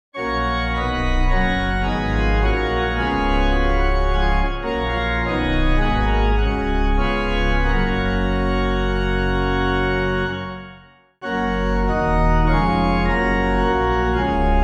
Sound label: Hymn, organ; Hail to the Lords Annointed; Es Flog Ein Kleins Walvogelein